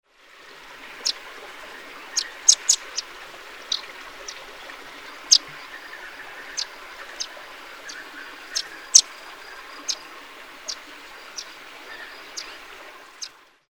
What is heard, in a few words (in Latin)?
Ptyonoprogne rupestris